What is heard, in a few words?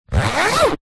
laptop case fabric pouch zipper; foley